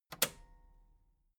metal button cassette stop push

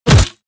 crossbow hit generic

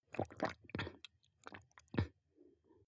drink glass milk swallow small

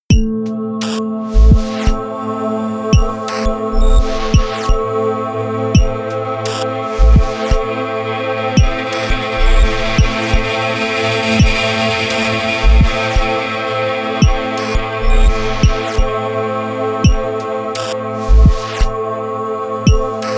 sequence electronic glitch beat

